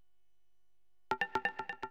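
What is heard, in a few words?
percussion, house, 125, drum; eJay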